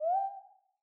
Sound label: woop